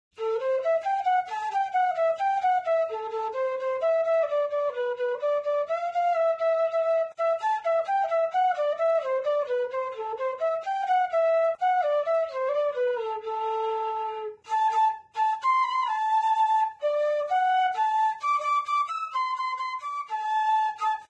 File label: Flute; Zomboid; Lifestyle